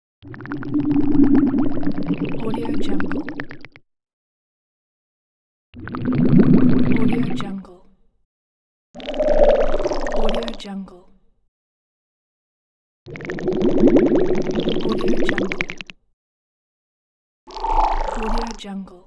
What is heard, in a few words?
underwater transitions